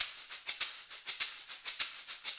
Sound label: Acoustic Percussion